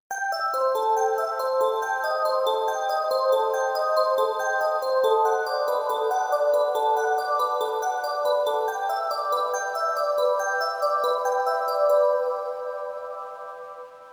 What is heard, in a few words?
Synth Bell